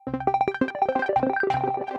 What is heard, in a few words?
Sparkling Blips; Piano & Keys; Ableton; Factory Packs; Granulator III